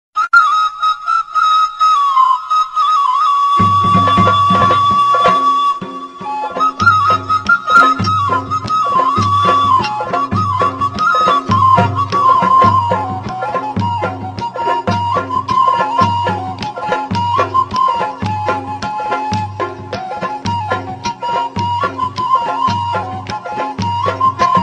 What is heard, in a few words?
Flute Song